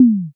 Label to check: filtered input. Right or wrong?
Wrong